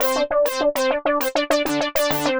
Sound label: Skroink Pulse Synth, Keys; Rock